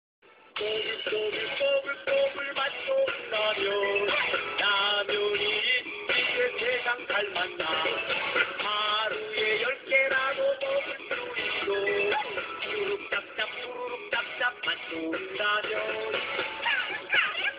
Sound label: RAMEN RAP